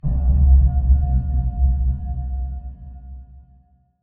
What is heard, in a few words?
Distant Soft Rumble; User Interface